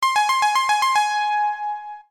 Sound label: chime